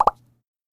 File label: square Sound; NumberChart